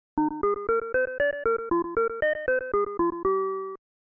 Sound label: Item Obtainment Jingle; Super Metroid